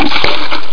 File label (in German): platsch